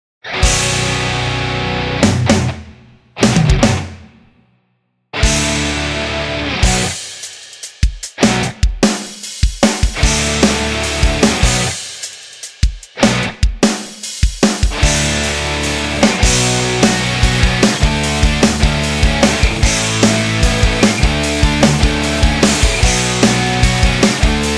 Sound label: hard rock